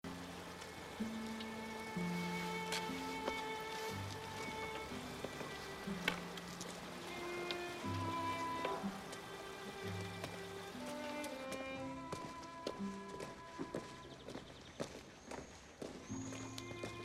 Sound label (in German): Musik